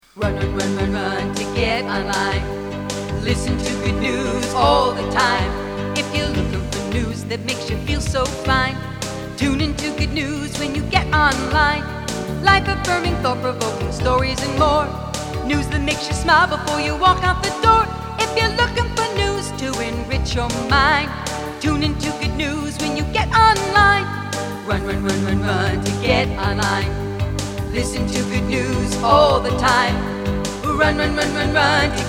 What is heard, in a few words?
Good News Jingle Rock